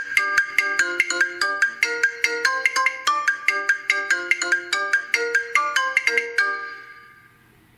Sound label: Xiaomi Ringtone; Change